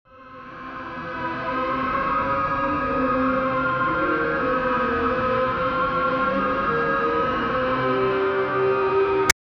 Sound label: distorted old timey music, horror ambience